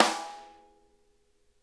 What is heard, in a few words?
rim mf R, snare; Sonic Reality; Interactive Drum Kits